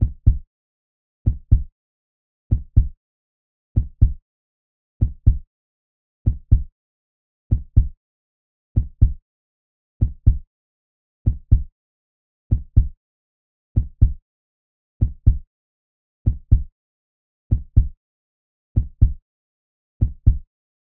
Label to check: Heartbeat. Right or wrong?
Right